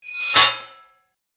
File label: Tank hatch closing